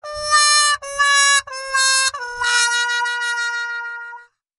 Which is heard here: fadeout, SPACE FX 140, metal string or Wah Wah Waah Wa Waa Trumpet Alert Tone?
Wah Wah Waah Wa Waa Trumpet Alert Tone